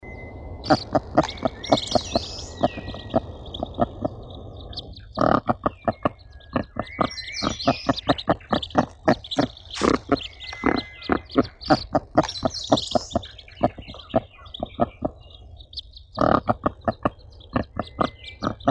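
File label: scaly-sided merganser mergus squamatus; krohal cheshuichatii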